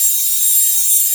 light binding loop; LoL; lux

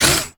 basic attack; jinx base

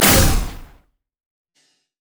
prismatic wave; LoL; lux

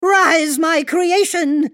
ultimate effort; Heimerdinger

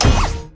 Ball Flash; orianna

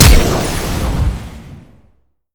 summonerspell base flash; LoL

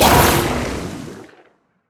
explode; Vel'Koz

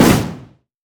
turret explosive; LoL; heimerdinger

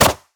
Shen crit attack; LoL; TPAShen

